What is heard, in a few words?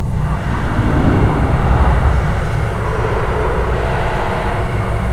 snake steam